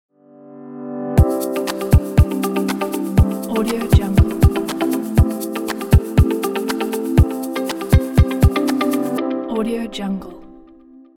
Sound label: corporate ident; secondsquaremusic